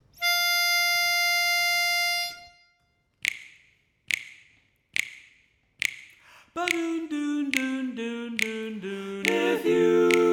lead, chorus

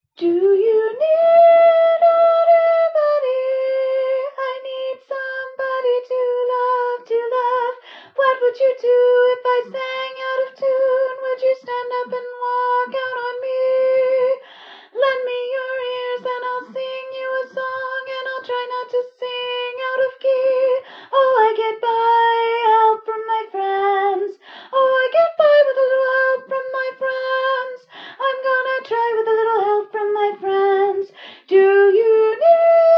Tenor, chorus

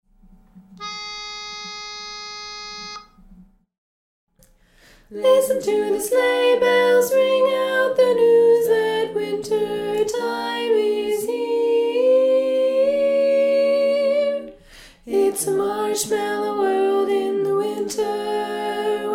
Tenor; Tenor Dom

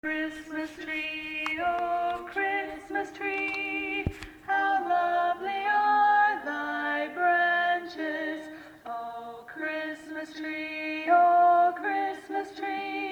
Lead; O Christmas Tree

